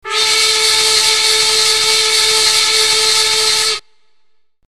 effekt vonatkurt

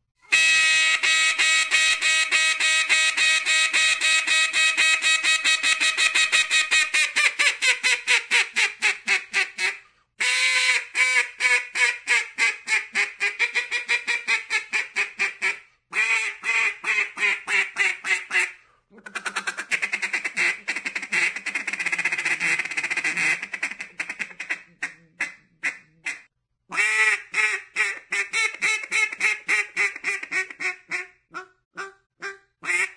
kacsa; vadhivosip

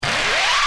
effekt

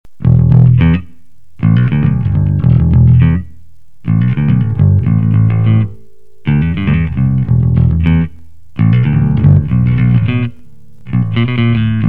bass also, basszusgitar; Handmade Guitars and Basses

